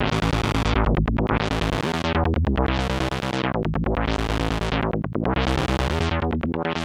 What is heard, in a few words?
SNTH BASS TEKNO 140 A